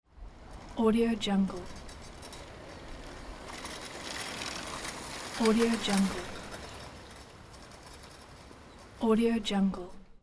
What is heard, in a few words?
bicycle passing by